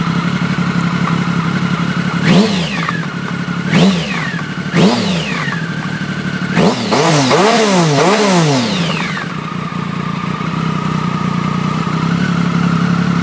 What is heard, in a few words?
bike